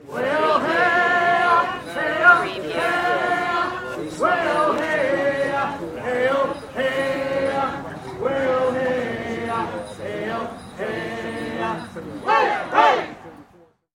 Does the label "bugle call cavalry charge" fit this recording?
No